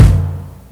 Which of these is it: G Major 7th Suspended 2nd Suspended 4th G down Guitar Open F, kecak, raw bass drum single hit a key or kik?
kik